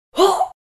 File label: Death scream man dying pain